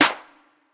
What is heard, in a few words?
hand clap sound d key, Royality free clap one shot tuned to the D note. Loudest frequency: 3216Hz; percussion; single hits one shots